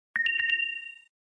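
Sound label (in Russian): razriajennaia batar lg, low battery LG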